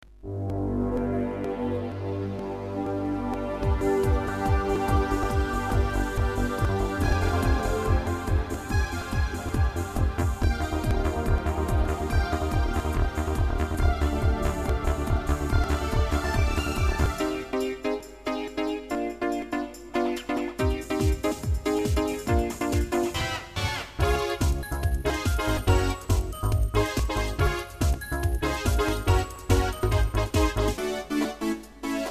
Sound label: technics kn5000